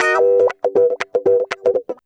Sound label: 120 GROO WAH, Electric & Acoustic Guitar Loops; USB Soundscan vol.04; AKAI